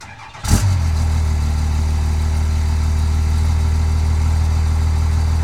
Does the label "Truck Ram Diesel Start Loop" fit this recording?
Yes